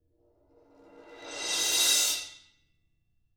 ZILDP SWELL

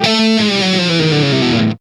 HEAVY STABS; East West; Global Sounds

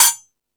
Tool Kit, Drum Machines

